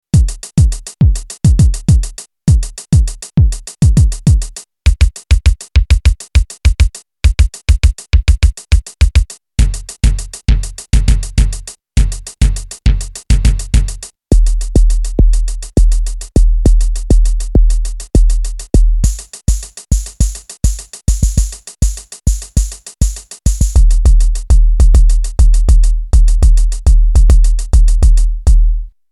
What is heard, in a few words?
EMW Digi Drum some kicks